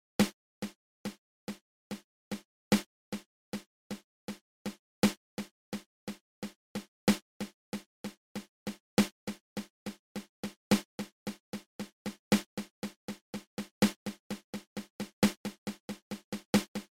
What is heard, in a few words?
Double Paradiddle open close open